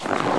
snow, player

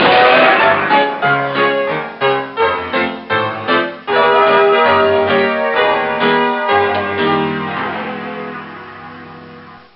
piano

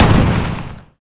turret fire

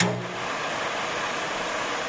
welder idle; weapons

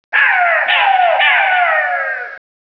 ambi, jungle; gearbox

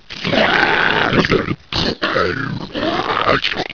zombie idle

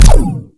plasma fire

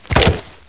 pl fall pain, player

